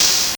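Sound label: ChipShop GB Open hat; DRUMS; HATS; ChipShop Deluxe; ChipShop Hits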